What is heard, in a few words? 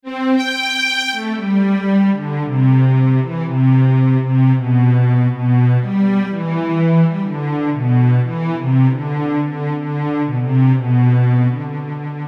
strings c maj; EarthArXiv